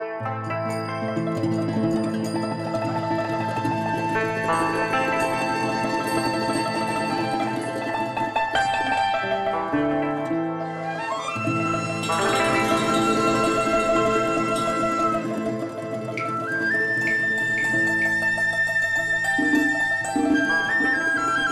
TRADITIONAL Chinese, Picking Flowers

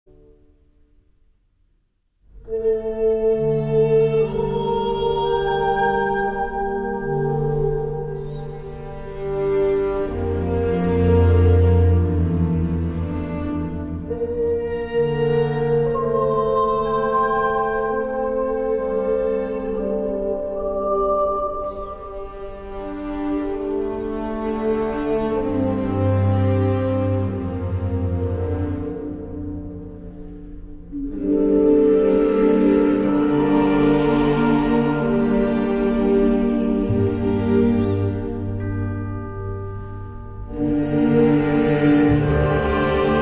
2 choirs, winds, bells and strings; Beatus Petronius

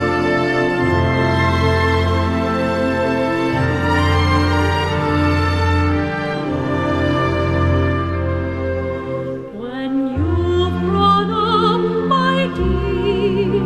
Slovak Radio Symphony Orchestra; Babes In Toyland

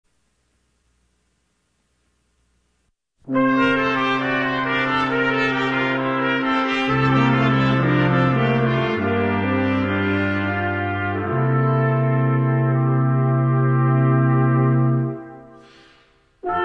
Brass Quintet, Medley; Christmas Crackers II

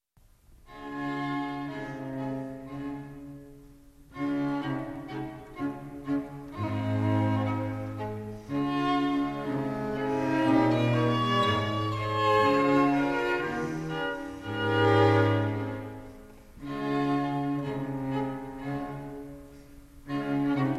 Guitar Quintet in D; Luigi Boccherini; Guitar Quintet #5

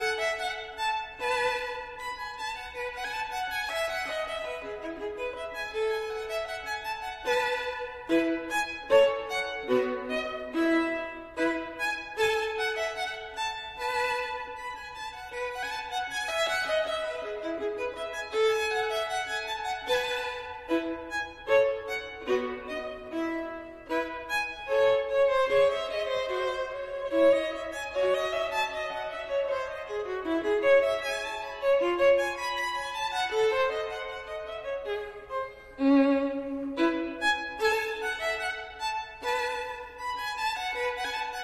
Gavotte en rondeau, Violin; Bach, Johann Sebastian

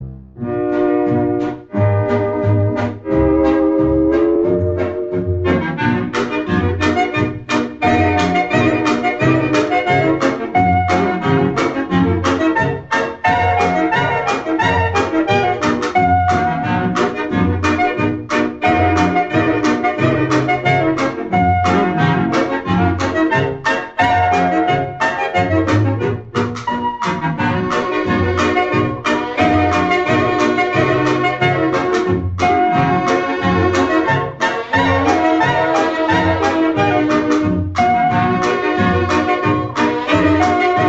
Clarinet Polka

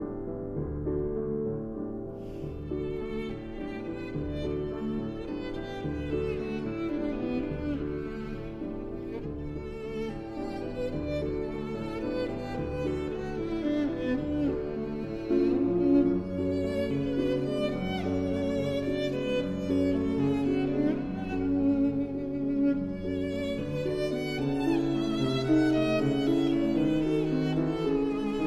Violin and Piano in D

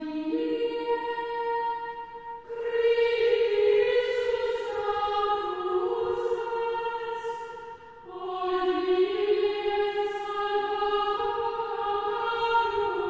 Chant Antiphon; Traditional; Chester Processional

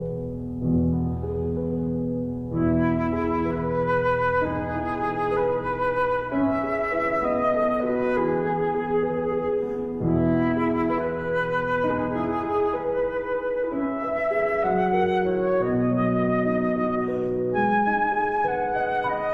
Berceuse (Lullaby) for flute and piano

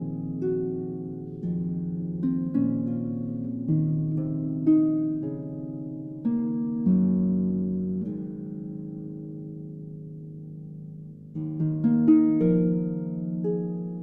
Harp Concerto Lullaby

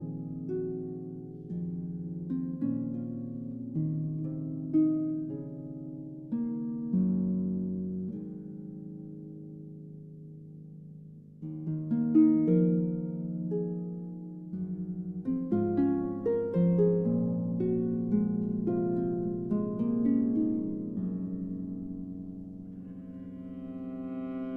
Harp Concerto Lullaby

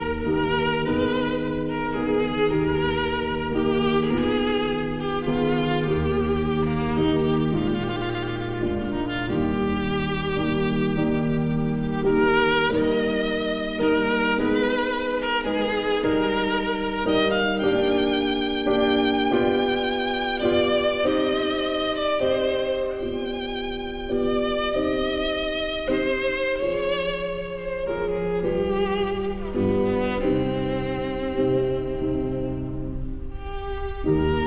Sicilienne for violin and piano

